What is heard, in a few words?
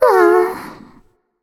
sigh; discordia